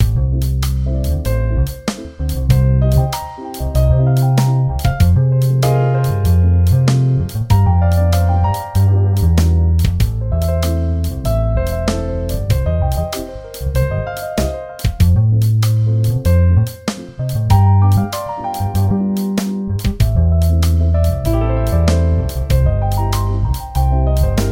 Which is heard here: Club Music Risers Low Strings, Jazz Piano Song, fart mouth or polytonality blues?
polytonality blues